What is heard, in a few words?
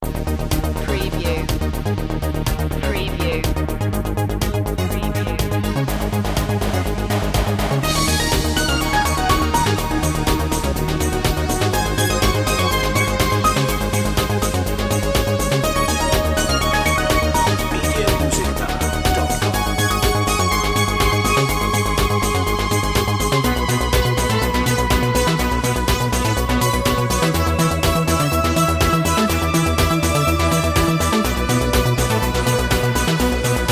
happy motion